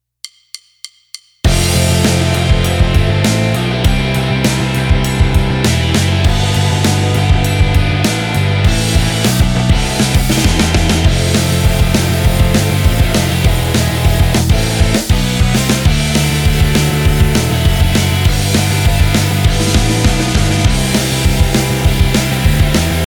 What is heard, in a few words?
snare